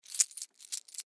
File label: Coin Hand Jingle Multiple; Money Sound Effects; Objects; Foley